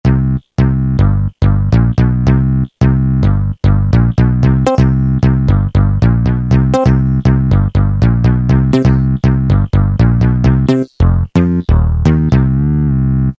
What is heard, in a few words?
Dual VCF Bs; Roland M-DC1